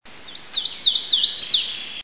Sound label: hooded, bird sounds; Aves